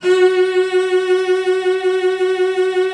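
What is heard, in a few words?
F#4, cello vibrato; Xsample VOL-7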